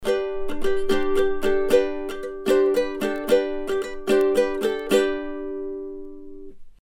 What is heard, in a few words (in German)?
Sontronics STC-3x Kugel Ukulele